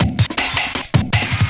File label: Drum & Bass, 160 BPM